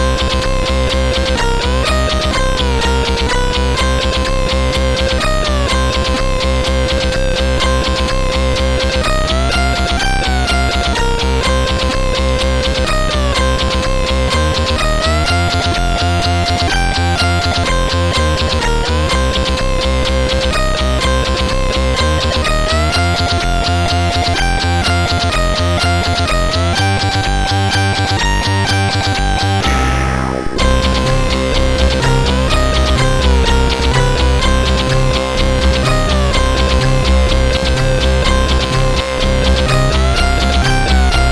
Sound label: Kung-Fu Warrior Ditty, CSG8580